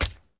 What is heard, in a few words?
squish